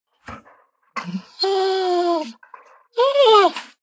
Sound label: dog whine low; natur-tiere; hunde